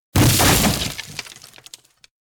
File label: break wood